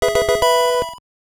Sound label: Fanfare - Item Obtained; pokemon red green blue yellow